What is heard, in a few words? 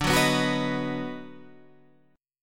Eb Minor Major 7th Sharp 5th D, chord